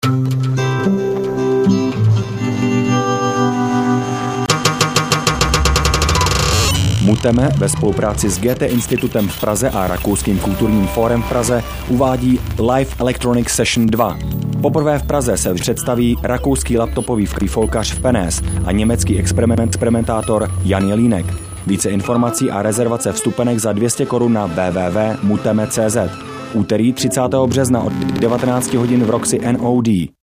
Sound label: live electronic session